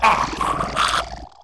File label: drown, crusader; scourge